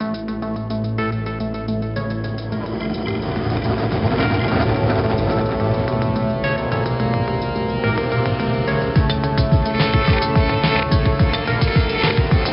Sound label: Bkg Music; math blaster in the prime adventure